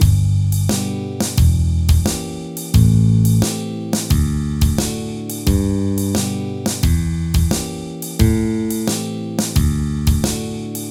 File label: slowfox